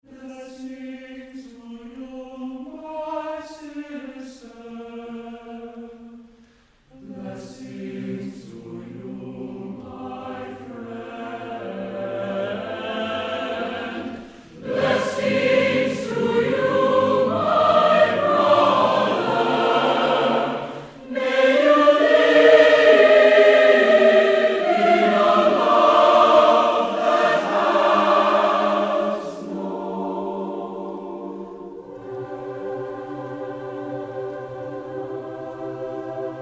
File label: chorus benediction